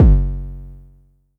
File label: Low Kicks F#1; LIMAT Div Dept; Drums